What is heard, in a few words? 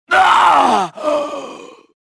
death; kyle